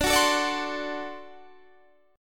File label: D 7th